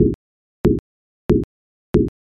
fs carpet

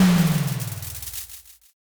firework burst

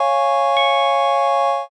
church

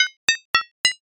pinball

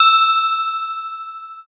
handbell

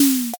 drawer

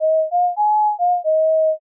matsuri fue

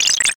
velcro

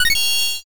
notify superchat

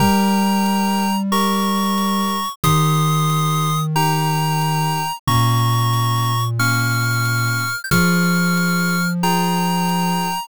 bgm mellow

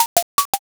reload

